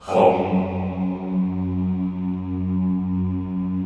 CHO F#1, Vohmm Hohmm; Sound & Vision; Gigapack I CD 2

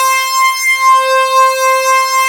Bender C5; Proteus 2000; Digital Sound Factory Proteus 2000

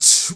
male solo, male noise

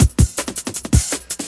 Outboard 162, breaks

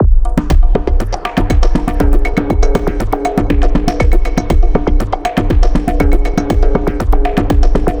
Drums Dub Loop; Sonic Foundry; The Electronic Music Manuscript Collection; Drum Loop Structures

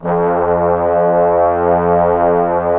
BRS BSTBN, Bs.Bone Sect; Roland LCDP06 Brass Sections; Bs.Trombones

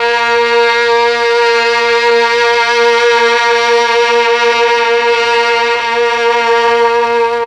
MEL STR, Legendary M400; ILIO; Propeller Island

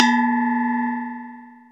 METAL WAV LO

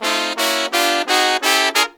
Pop & Funk Brass

